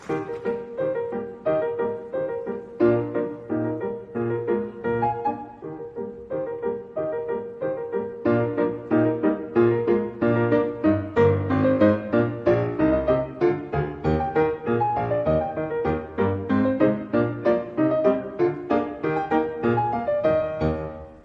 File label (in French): ecossaise; beethoven ludwig van